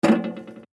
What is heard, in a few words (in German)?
Tritt gegen Eimer